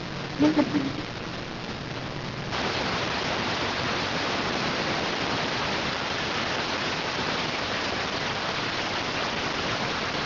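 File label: radioteletype receive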